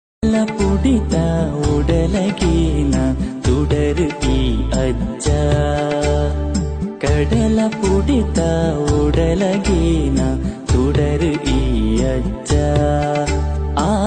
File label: devotional kannada song; koragajja